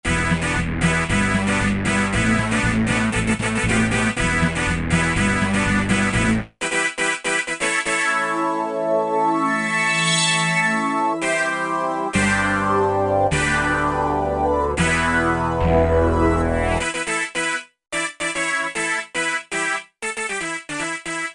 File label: Syn Brass, E-mu Morpheus; Factory Presets